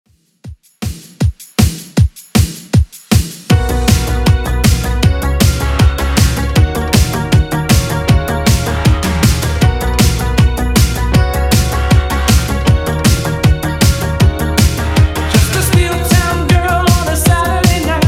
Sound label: 80s Redrum 157bpm